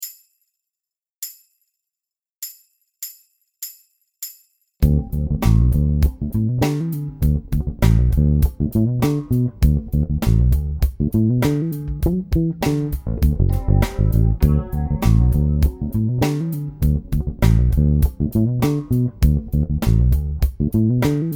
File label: R&B 70s, bass